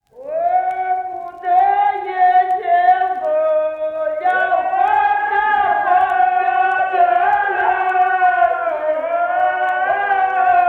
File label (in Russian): Соловей мой, соловей, Народные песни; Фестивали фольклора